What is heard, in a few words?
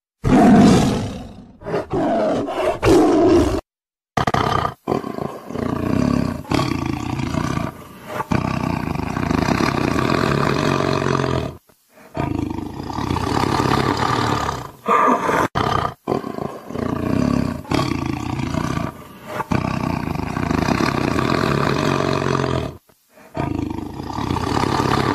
Tiger Sounds